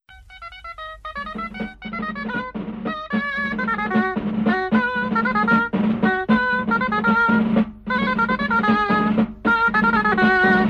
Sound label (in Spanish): Danza de la Cruz